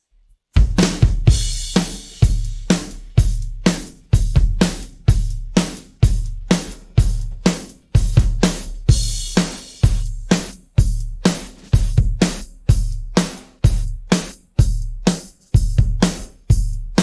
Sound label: pattern, drums